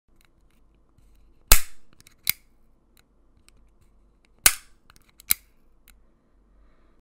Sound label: staple